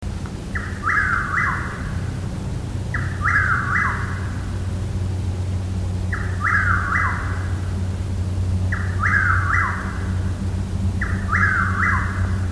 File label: Chuck wills widow, Maryland; Anne Arundel Co Maryland